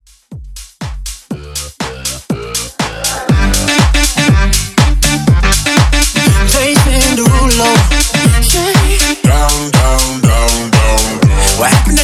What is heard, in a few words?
Uptempo 121 BPM